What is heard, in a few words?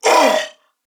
Pain Corpus Venus Male, en; Dialog; Barks; Battle; Warframe; CorpusVenusMaleB